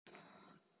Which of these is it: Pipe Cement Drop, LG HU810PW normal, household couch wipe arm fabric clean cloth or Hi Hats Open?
LG HU810PW normal